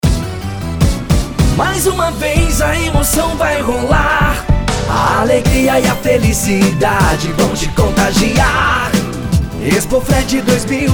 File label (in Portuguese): Jingle Institucional; Expofred 2018